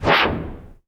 cinematic impact wipe

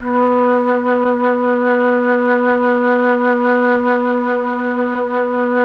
MELLOTROF

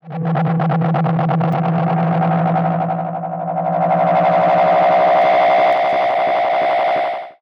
Tape Delay Feedback; radiosonics

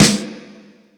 Stadium Rox, Drum Machines, E-MU Pro-Cussion

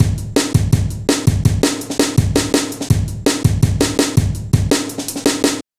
Heavy Live A, Drum Loops, Jungle; Zero-G Jungle Warfare 1, 2 & 3

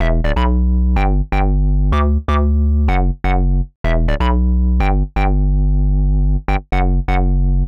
Hard Synth Bass E, 125; PowerFX - Nu Jazz House

